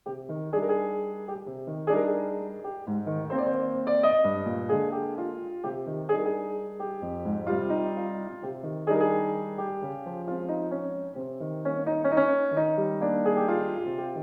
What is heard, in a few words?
waltz